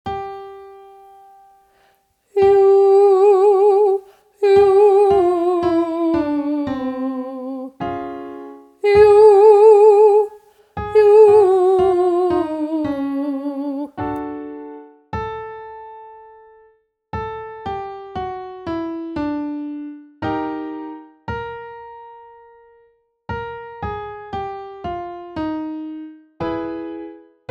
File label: 5 note scale yoo ascending, vocal exercises